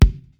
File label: Kick